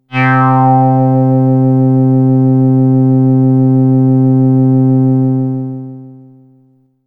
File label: Sun Sigh B, synthpad